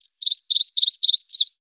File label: Bird Call